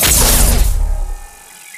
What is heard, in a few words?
plasma gun fire